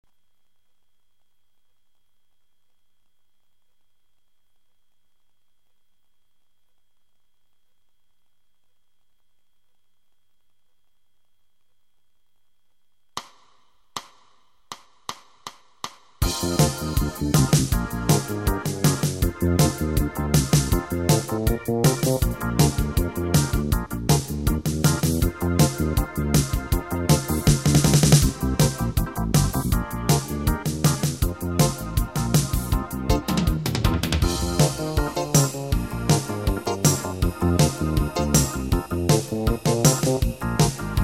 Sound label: F 8 Beat, Blues Playbacks